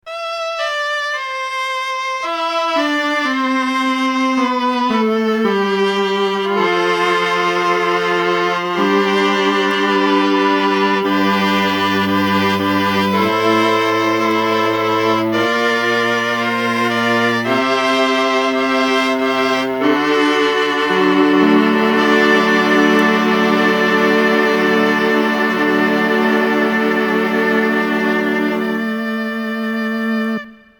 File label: viola